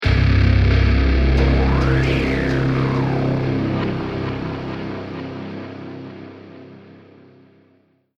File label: deathcam; music